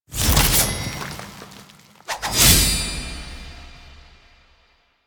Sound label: Rises Sword Grab Swing; Warframe; Sacrifice; Sacrifice Umbra Rises